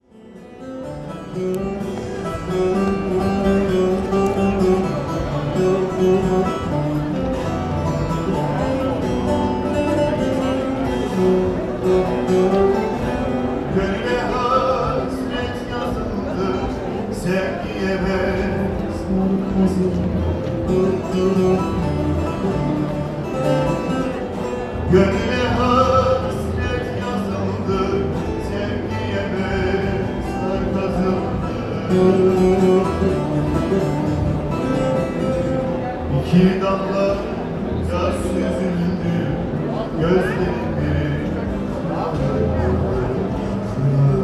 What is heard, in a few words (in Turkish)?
Türkü evi Taksim